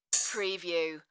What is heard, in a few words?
High Hat Open; rollovers